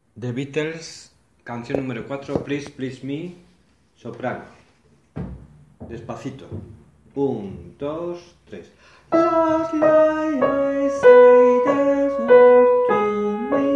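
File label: soprano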